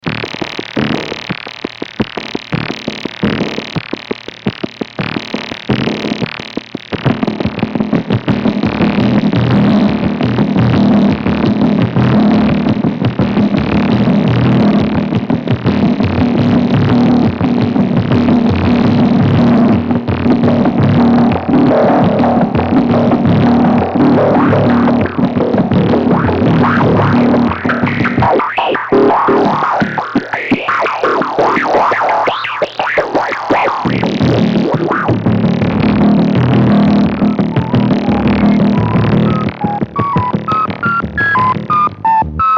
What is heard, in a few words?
Buchla Box Music Robot; Warners